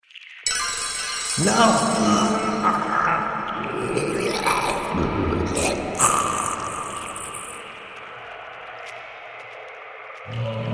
Man Changed To Cave Troll By Wizard, monster sound effect